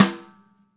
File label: Ludwig Black Beauty Snare FFF Room; Sonic Foundry; Sony Creative Software; Vital Drums; 1969; Snare One-Shots